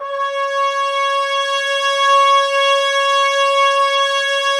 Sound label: Woodwind Brass Ensemble; Miroslav Vitous